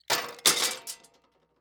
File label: Nunchaku Fall On Metal Floor, weapons; AdobeCC